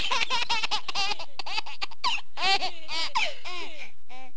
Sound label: laugh